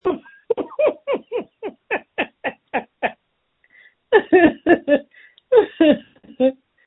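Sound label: screams that feature laughter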